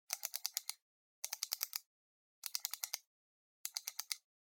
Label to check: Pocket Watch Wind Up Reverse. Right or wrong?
Right